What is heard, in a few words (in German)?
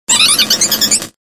Ruf